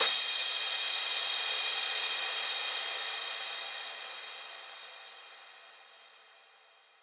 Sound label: Crash